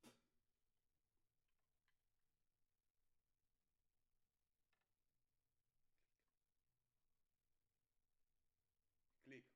Kit, 94bpm

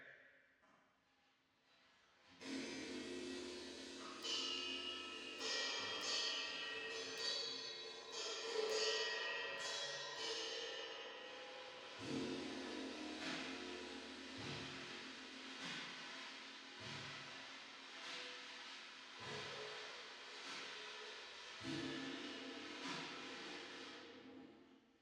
Drums Verb

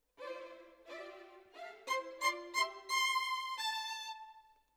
Violin Solo

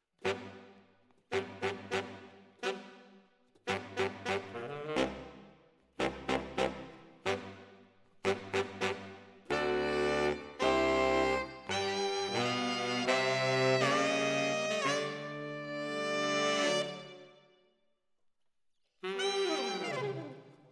ORTF SCHOEPS, piano

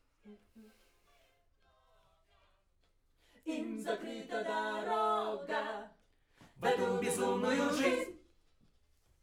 Backs SCHOEPS

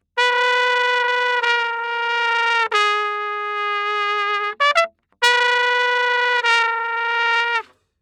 Trumpet

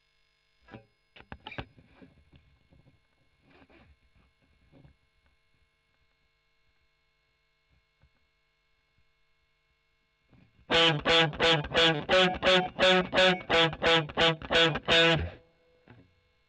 E. GTR Gefell OD

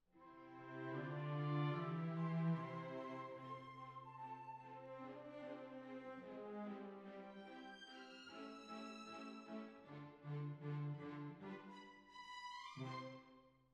Cbasses

